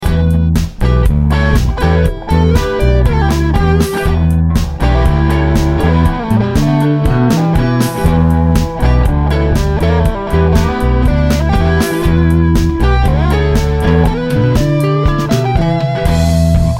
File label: Fuzz